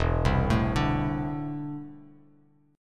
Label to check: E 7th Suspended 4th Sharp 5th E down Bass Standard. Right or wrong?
Right